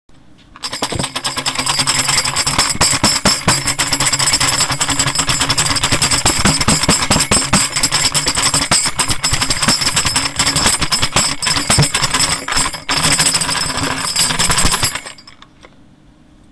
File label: noisemaker; Metal tambourine with undecorated paper head; Halloween noisemakers; T. Cohn